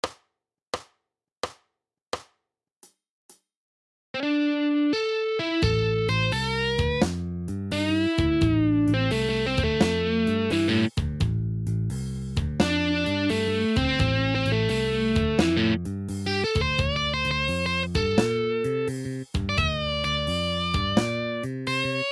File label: Style Solo